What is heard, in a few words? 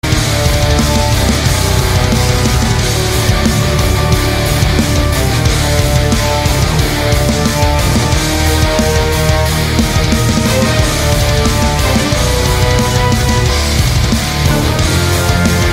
Metal; The Hunter